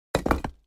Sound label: brick; stone